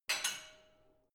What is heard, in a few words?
barbell only replace, fitnessstudio